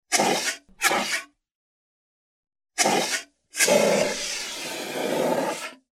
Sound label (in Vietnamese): tieng phun bot kho binh chua chay cuu hoa